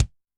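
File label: bass drum, Kick, Yamaha DTXpress-II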